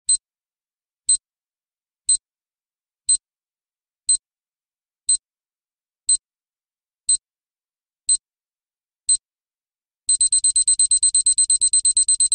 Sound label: Timer Countdown